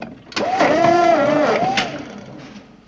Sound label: Forklift Kane Leg Up